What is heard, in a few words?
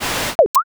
gacha roll